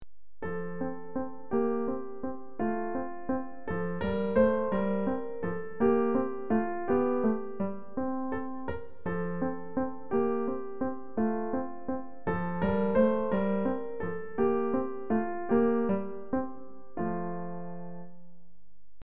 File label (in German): Walzer